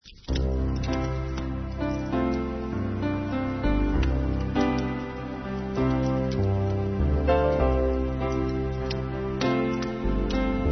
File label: Concerto In D